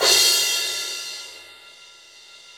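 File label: cymbal crash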